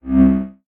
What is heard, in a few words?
notifications; RED; Hydrogen-One